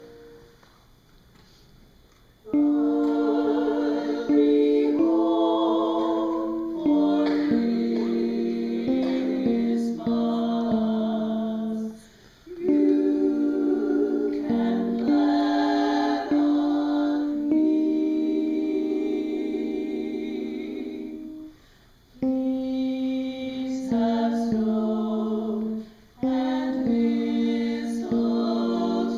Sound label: alto 2